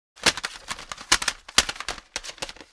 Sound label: Pile of CD and DVD cases searching for a disc